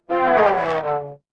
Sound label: feedback fail